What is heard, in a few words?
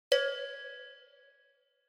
Cowbell (Reverb)